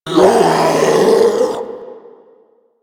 Infested Ancient Strike Scream; Enemies; Warframe

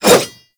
Dagger Heavy Whoosh; Warframe

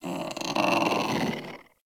Puppy Idle Purr; Vocals; Warframe; Kubrow

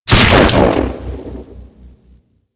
Leech Projectile Fire; Leech Osprey

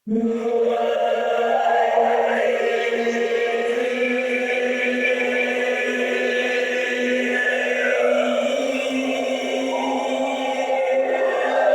Choir; Warframe; Sentients

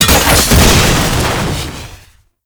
Cannister Ignite; Enemies; Abilities; Warframe; Tau; Dax Archer

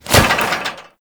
Restrained Foley, Worker; Warframe; Characters; Solaris

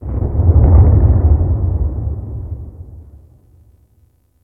Ice Distant Ice Break Large, Ice Planet; Warframe; Corpus Ice Planet; Ambience

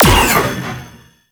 Space Fighter Fire; Warframe; RailJack; Enemy Ships; Sentient; Coil Agent

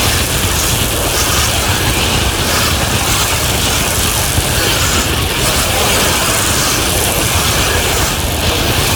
Tornado Loop; Ambience; Warframe; Clan Dojo

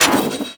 Spear Gun Mech; Warframe; Tenno; Hoplite

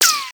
Bullet Impact Ricochet; Warframe

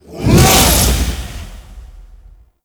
Impact; Condemnd; Warframe; Priest

